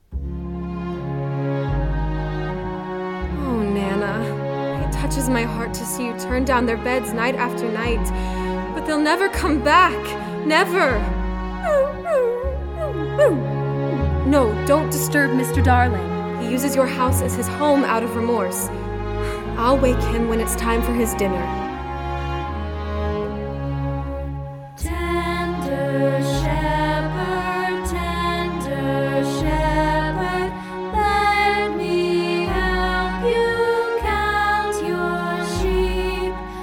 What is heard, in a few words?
nursery music